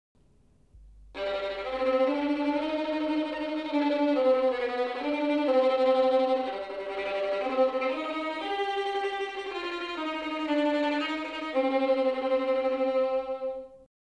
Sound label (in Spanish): Sonido de violin en tremolo; Instrumentos de cuerda; Cuerda frotada; Sonoridades especiales